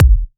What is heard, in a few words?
Bassdrum